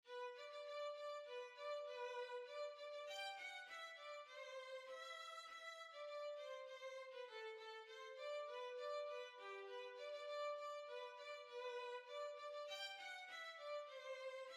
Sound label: Polketta, Violin